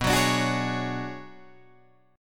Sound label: B Minor 6th Add 9th B, Guitar Open D